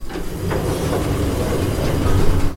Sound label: elevator door close; soundscrate